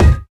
physics cube

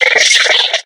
box monster chitter

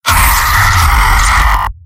soldier pain severe; mvm

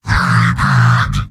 heavy negative vocalization; mvm

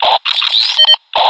status tick; ui

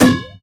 phys bouncy cube

